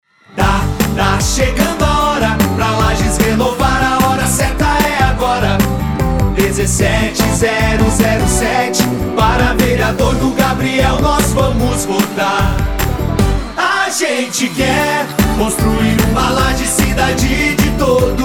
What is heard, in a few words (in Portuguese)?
Jingle VEREADOR; Ta chegando a hora; Gabriel; RENOVACAO